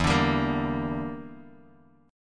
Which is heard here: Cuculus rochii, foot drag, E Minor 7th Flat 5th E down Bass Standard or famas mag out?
E Minor 7th Flat 5th E down Bass Standard